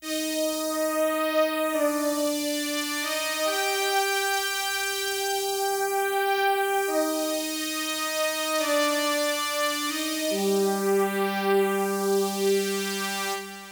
C1, Melody; Techno; TechnoMusicMaker

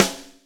snare

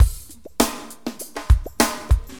LOOP, HipHop Drums; LiveAct Pool 1; AcidJazz Funk

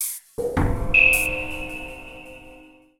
PERCUSSN AMBNT 160, Ambient, Drum

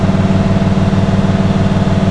MOTOR, Slot Car

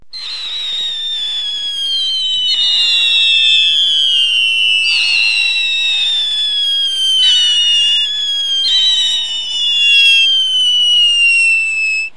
Piccolo Petes, fireworks; Household

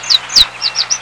finch; Sound Effects for Multimedia - Volume 1; birds

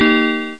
Ballin

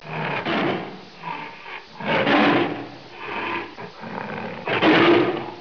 tiger; 12000 Graphics Pack - A Complete Graphics Collection For Your PC

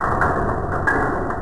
Noise; PCGamer50

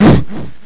pain, player; Games World; Quake; aamoon

